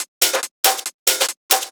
Loops 140 BPM Hihat Percussion Loops

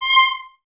Violins Detache C 6; Miroslav Vitous; 11 Violins

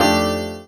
CHORDS; EastWest; Dance Mega Hip Hop Electro

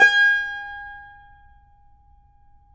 CUMBUS G#, Guitars; Spectrasonics; Hans Zimmer Guitars VOL-2

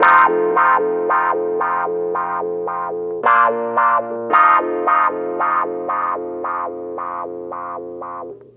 Raw Funk Clavinet; Sonic Foundry (Sony Creative Software); What it Is! 70s Analog Funk

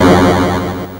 Atari Noise Long, Atari 2600

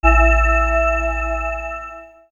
Swirls, pads; X-Static Goldmine

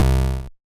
Buzz; Bitkits

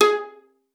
G#4, VIOLA PIZZ; Xsample VOL-5; 90 s Sample CDs

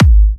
G#, kicks; markus hakala kicks

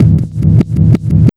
move 138 FX, big beat drumloops; USB Soundscan vol.25; AKAI

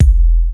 Bd Sub, Kick Drums; Zero-G; Soundclash

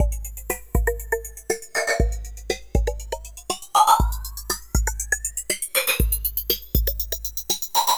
Drums Strange

